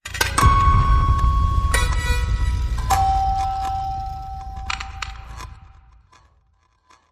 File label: Music Box Melody